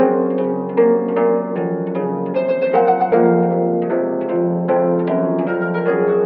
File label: keys